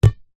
sport ball netball cheap catch hands

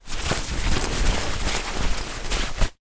foley sleeping bag movements

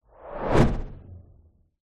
science fiction ultra fast pass by whoosh; zapsplat

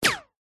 bullet pass by; zapsplat

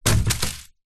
tree branch drop ground leaves; zapsplat

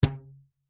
foley rubber band ping; zapsplat

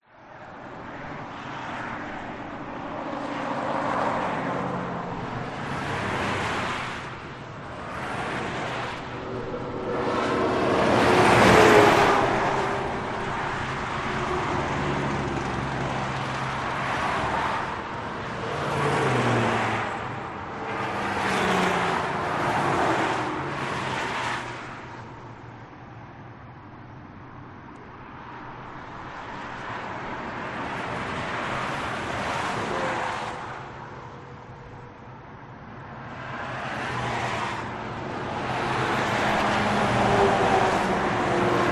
USA Interstate 70 Colorado

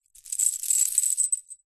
animals dog collar chain pick up

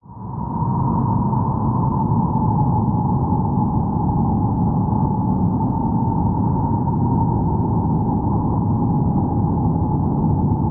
wind hurricane strong constant howling; nature

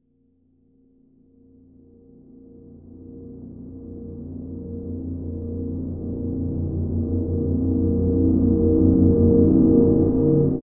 Swell Metallic Ring; bottlerocket

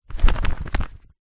animals bird flap wings short on ground

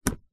car 12 volt usb convertor plug in; vehicles; zapsplat

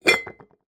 household ceramic ornament lid remove

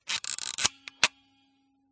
smena symbol camera wind film lever; dpa4060; pmsfx

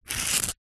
office brick pen ball point score mark

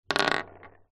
industrial ball bearing set down on table; zapsplat

